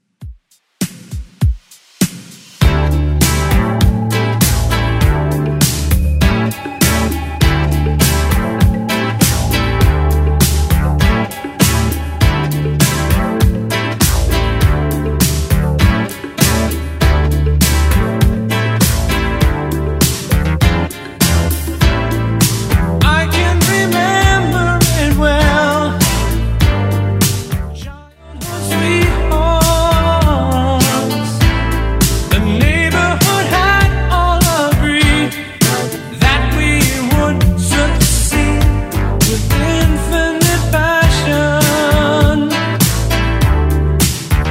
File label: 80s Redrum 100bpm